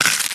nuts break; Candy Crush Friends

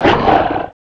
Puppet Wolf Dmg; FlyForInsanity

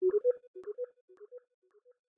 is typing; Microsoft Office; LYNC

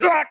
spirit hurt; FlyForInsanity

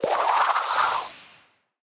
blocker octosmash flight; Candy Crush Friends

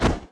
spirit attack; FlyForInsanity

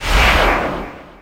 skill bow range strike; FlyForInsanity

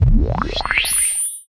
Healing; Fly For Insanity

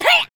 male Atk; FlyForInsanity

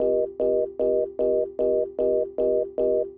fast busy; Microsoft Office; LYNC

